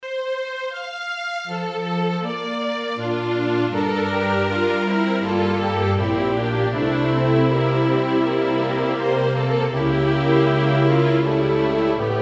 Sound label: baroque suite for string orchestra epilogue